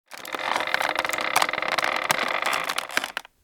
bois

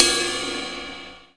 choix

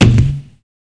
bruit echec; MOBICLIC 56; ZOOMZOOM

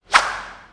Zioup

